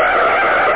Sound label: long skid